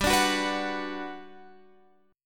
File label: G Major 7th, Guitar, Open F; Listen to GM7 strummed; GM7 Chord